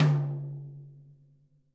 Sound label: tom, drum kit